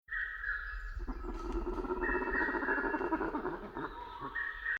Nature Jungle Rainforest Africa Daytime Hornbill Bird Single Uganda; Tom Hutchings